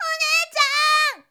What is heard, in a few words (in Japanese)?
shonen himei